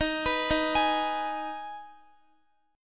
Eb Suspended 4th Sharp 5th Eb Guitar 4E 4A 5D 5G 5C 6F; Ebsus4#5; arpeggiated